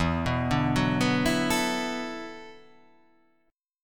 E 7th Suspended 2nd Suspended 4th, down

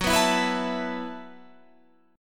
E Suspended 2nd Suspended 4th F sharp

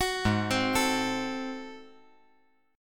Ab 7th Flat 9th, chord; Ab7b9 arpeggiated; JGuitar

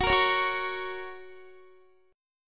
F sharp Major F sharp, Guitar; strummed; x,21,20,18,18,20; 4E,4A,5D,5G,5C,6F

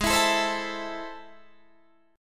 A Minor Ab, guitar; Am/Ab; strummed

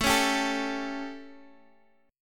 A 7th A, Guitar Half Step Down

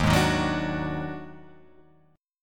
Eb Minor Major 7th Double Flat 5th; JGuitar

